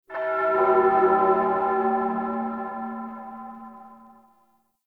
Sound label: Bell Texture, Crypt Ambience; One Offs; Champion Crypt